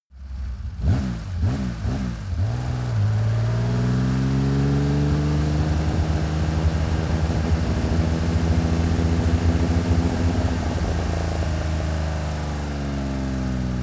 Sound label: car porsche 959 driving first gear high rpm